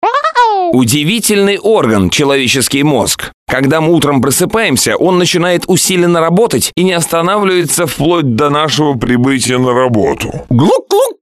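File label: for mobile, amazing organ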